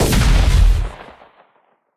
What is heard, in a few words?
Blaster